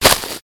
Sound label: Grab Havest